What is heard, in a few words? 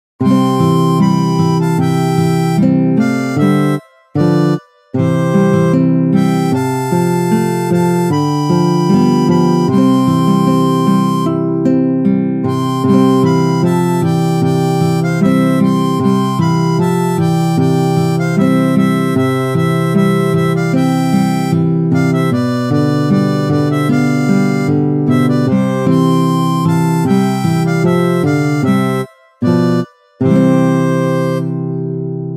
Harmonica; Joy To The World